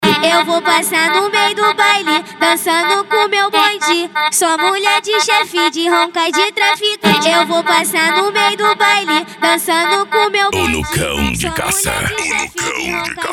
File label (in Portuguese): funk ritmado